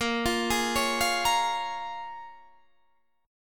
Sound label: A sharp Minor 7th, down, Guitar, Drop D